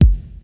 low tone kick sample g key, Royality free kickdrum tuned to the G note. Loudest frequency: 132H